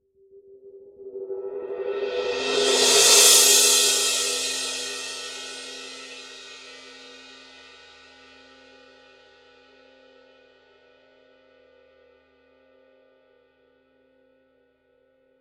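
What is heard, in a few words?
signature precision 20 heavy crash roll, cymbales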